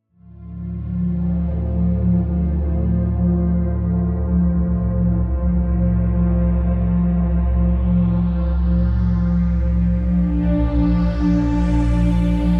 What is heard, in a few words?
Nonlinear Labs C15 Ambient